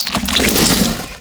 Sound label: Infested Flesh Door Open; Ambience; Props; Warframe; Infested Eris